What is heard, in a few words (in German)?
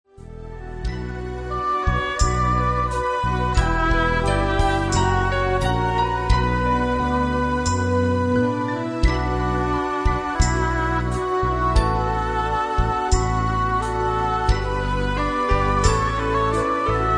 zwischenmusik playback